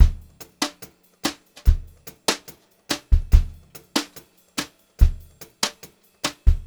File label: Brushed Drum Loops; USB Soundscan vol.39; AKAI